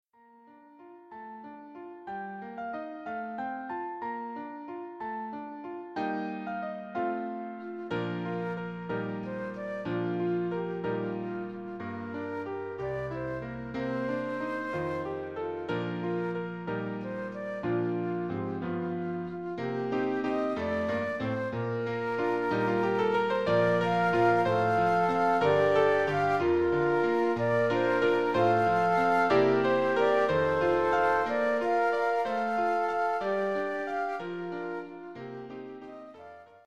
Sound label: easy duet